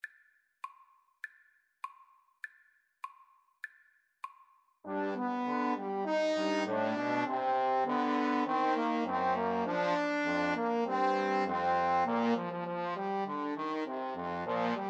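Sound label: 3 trombone karaoke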